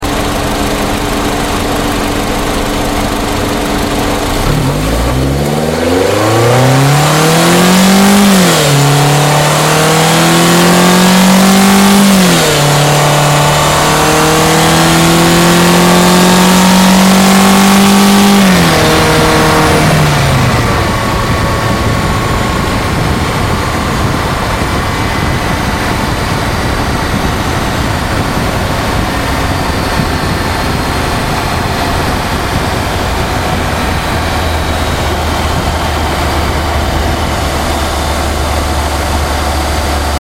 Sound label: Mini Cooper Acceleration Outside